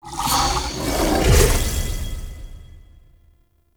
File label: Stag Energy Drain Cast; Powers; Stag Pact